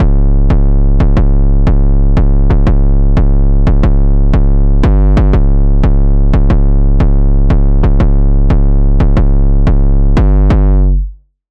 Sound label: 808, Sylenth1; 808 - Crooked Badge; Routed Sound; Sylenth1 Expansion - Street Vanquisher Vol.1